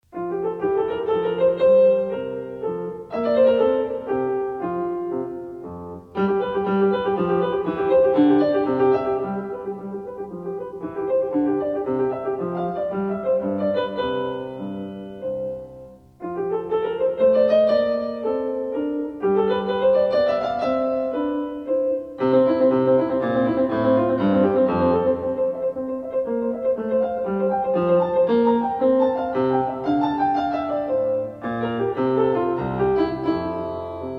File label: Minuet in F major; Marlais Book 4